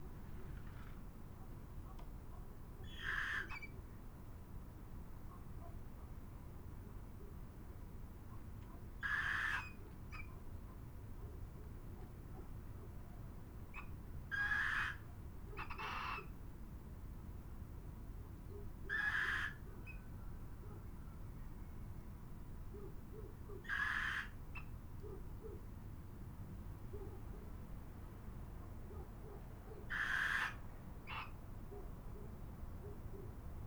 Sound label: Little Owl Soliciting calls of male female